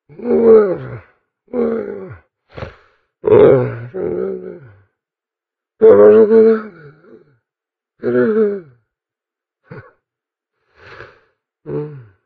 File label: sleep; customnpcs